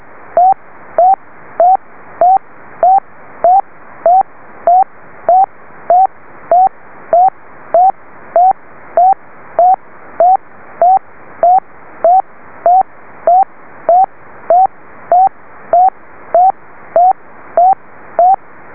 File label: 10GHz BEACON with BAOFENG UV-3R; BAOFENG UV-3R MKII als 10 GHz Bake?; DH1VY; German Amateur Radio Station DG9VH